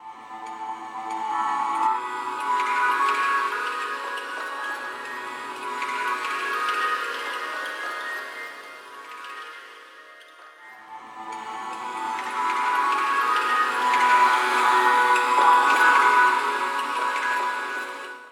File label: drift